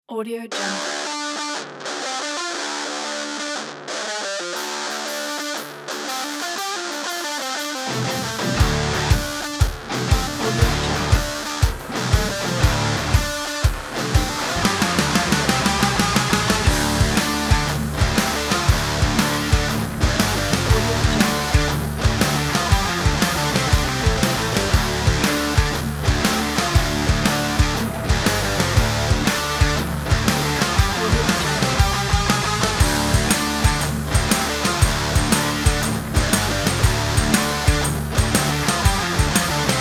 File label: grunge